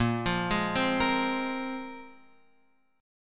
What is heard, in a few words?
A sharp 7th Suspended 2nd down, Guitar, Open F; arpeggiated; JGuitar